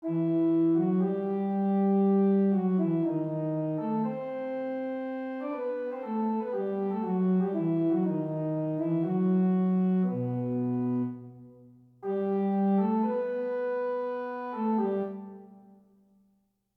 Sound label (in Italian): Fuga; Fuga n. 6 originale